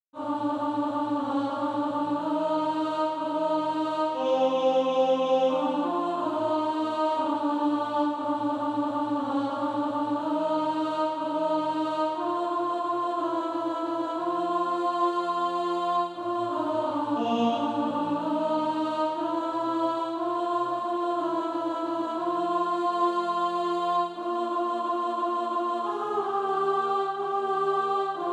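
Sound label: alto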